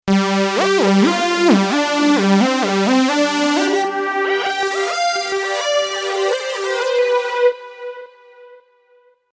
Lead; PC3; Fitty-Fitty

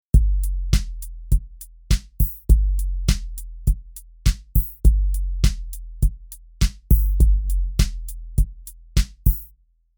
Kit, Drums; Roc-A-Fella Kit; PC3

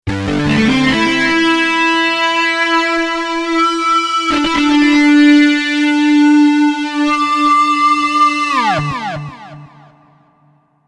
Burning Tubes, Guitars; PC3

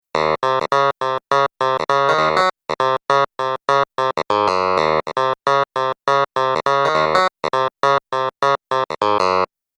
Crisp Clav; Clavs; MP10

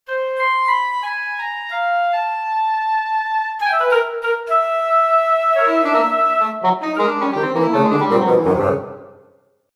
Woodwind Section, Orchestral; PC3

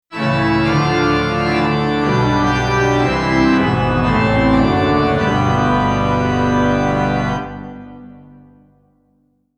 All Stops, Organ

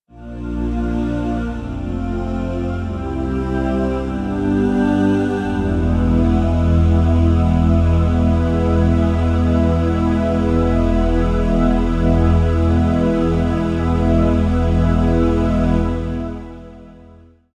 Aaahlicious, Voices

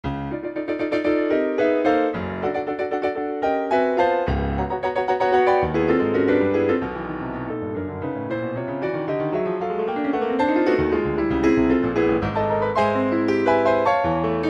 Stereo Dynamic Piano; k2600; ROM Option

